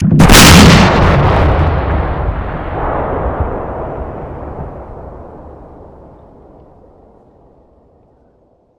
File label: explosion big; SuperPower 2 Redux V7A; unit